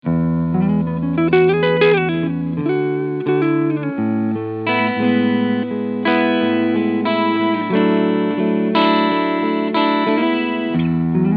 Jackson Pro Soloist SL2Q E Gitarre Clean Sound Neck Pickup

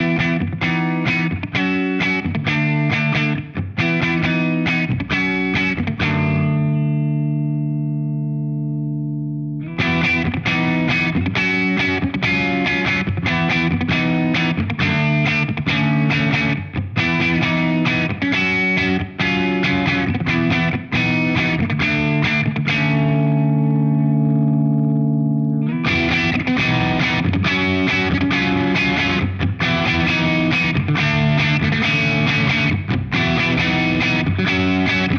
Walrus Audio Ages Alle Stufe 5 Gain Spektrum

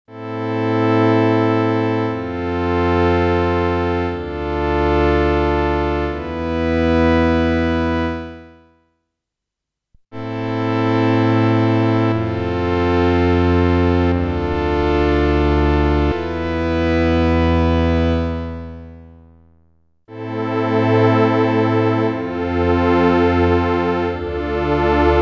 Novation Summit Analog Pad Vergleich

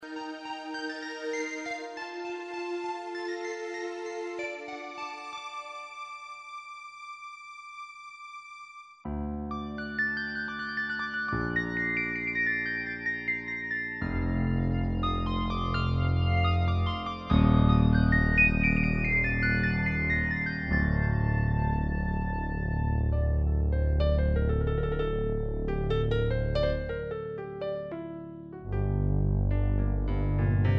K5K flugel

